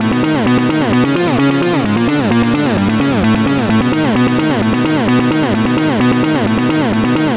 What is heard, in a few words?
NRG Arp, trance; Sonic Foundry (Sony Creative Software); Trance NRG VOL-1